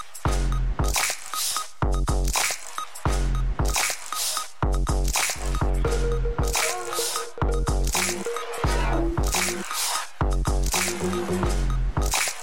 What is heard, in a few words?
click